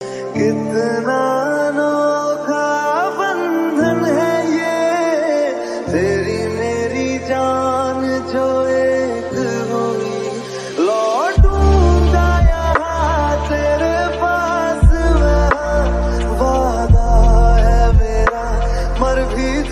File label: Hit Breakup Ringtone